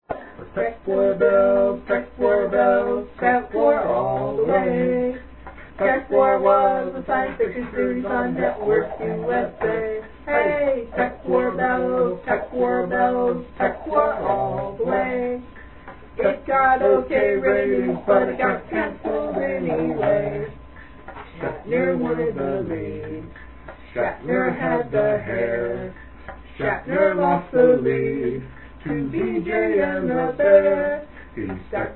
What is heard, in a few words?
jingle; christmas